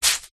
household sponge wet squeeze; zapsplat

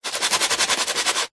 industrial sanding wood box sand paper

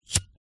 household cigarette lighter flint flick

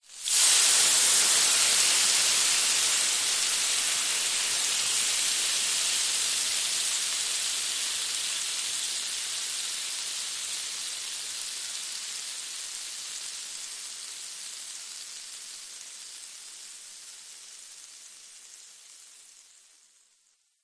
household water sizzle hot pan; zapsplat

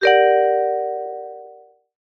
fantasy magic wand ping retro style; zapsplat

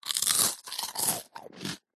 human eat crunch toast

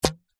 sport cricket bat drop grass

